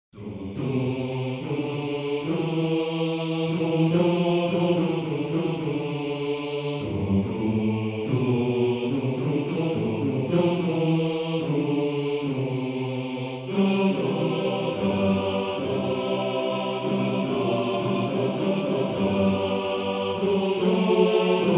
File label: Chor, maennerchor